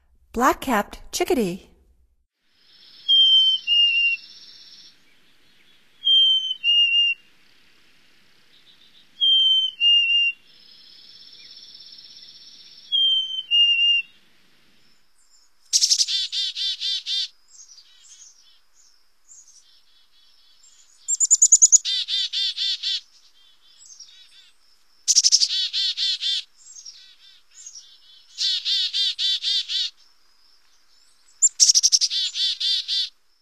Black-capped Chickadee, Songs of Birds of North America; Stokes